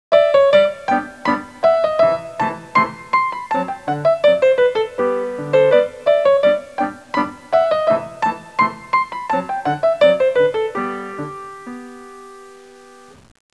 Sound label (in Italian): suonerie classica; musica classical